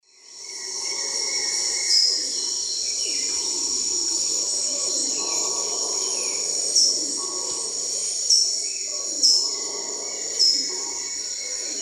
Saltator maximus, calls; S. America; Eastern Lowlands

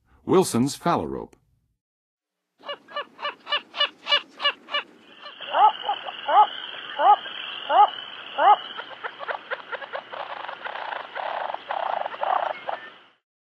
Wilsons Phalarope, Birds of North America; Western USA; Western USA Songbirds

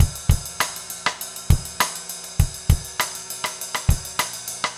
basic funk rims

